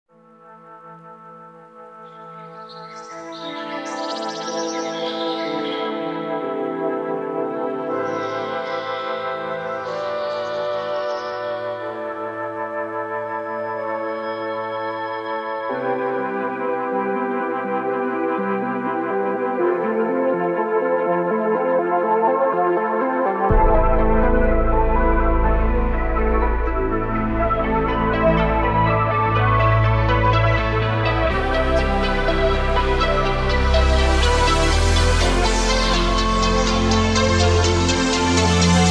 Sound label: Instrumental